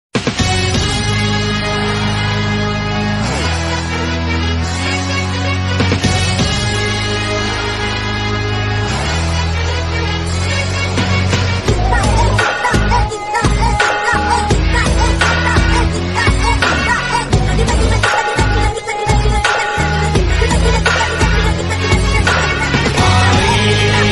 bgm ringtone south movie bgm ringtone tamil bgm ringtone telugu bgm ringtone; maari